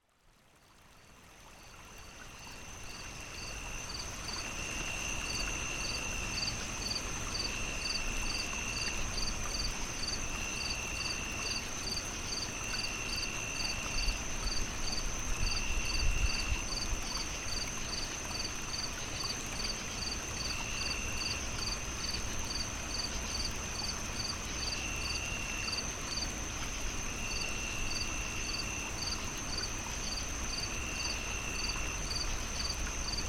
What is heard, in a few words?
Relaxing Nighttime Ambiance - Nature Sounds For Sleep Meditation Relaxation; Studying